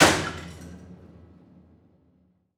Key Smash, Piano Destruction

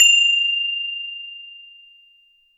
Hole Saw; Hole Saw Bits

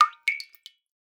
Drips

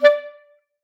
Clarinet

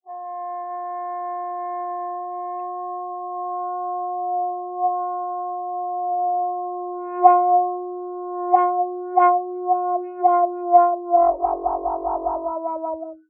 Long Note, Trombone Extended Technique

